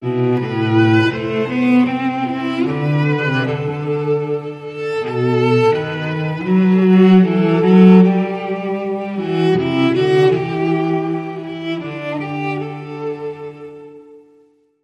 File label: Cello